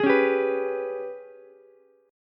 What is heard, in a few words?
Ab Minor Major 7th Double Flat 5th Ab, Ukulele; AbmM7bb5; strummed